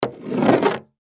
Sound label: Mousse Can Slide, Mousse Can Slide Wood Surface; Hair Salon Barber Household; Primping; foley; Primping Sound Effects; Human; Blastwave